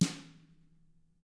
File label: TAMA BR MF, Drums; ILIO; Double Platinum Drums 1